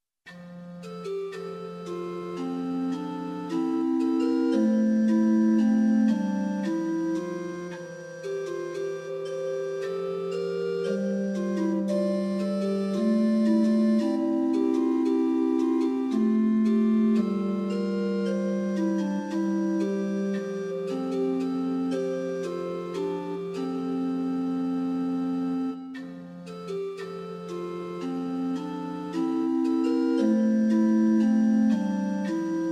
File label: Organ, Temperament Meantone, Rohr F 8; hymn